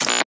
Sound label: Button long